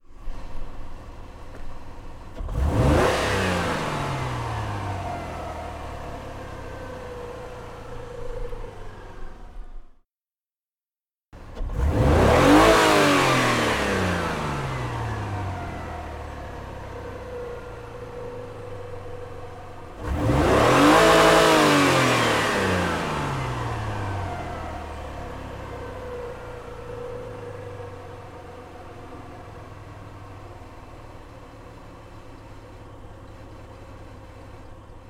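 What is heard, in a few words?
Engine Acceleration External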